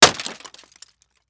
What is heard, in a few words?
Impact Plastic Smash; Impacts